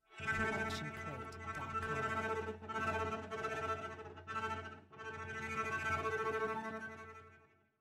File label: Cello Shivering Tremolo; SoundsCrate